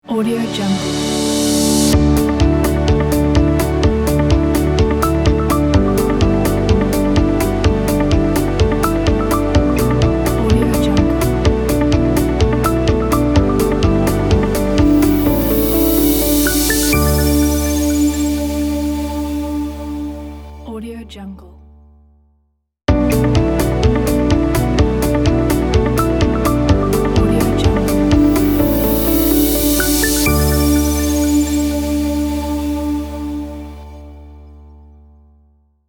corporate motivational logo ident

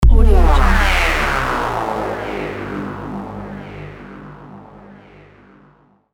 high tech kick

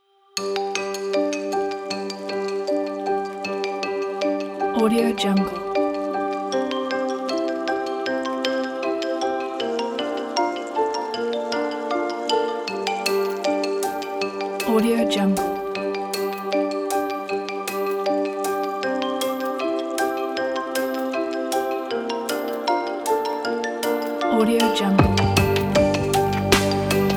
mystical forest

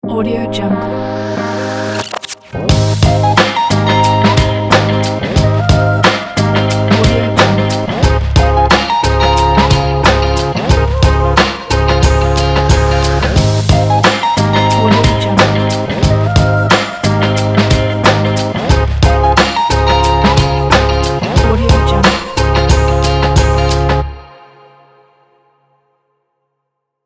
modern podcast hiphop opener

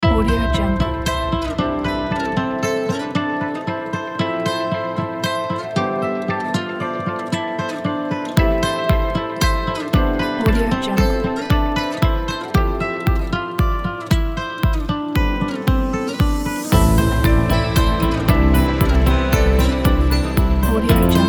ambient guitar inspiration kit

